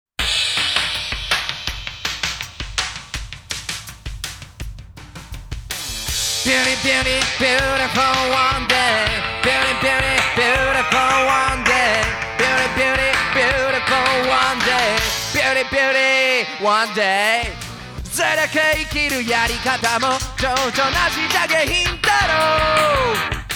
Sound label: Acapella; Gintama